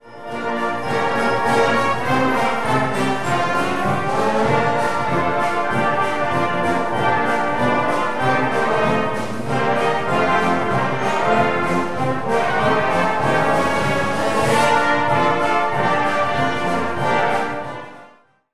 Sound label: brass band